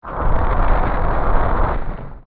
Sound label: Throw; Flare Dancer; zelda; OOT